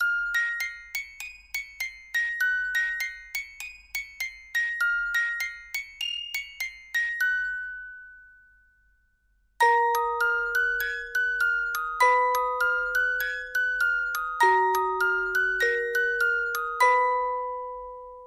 Sad Music Box

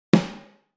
Acoustic Snare

